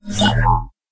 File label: teleport beacon teleport; smite; vulcan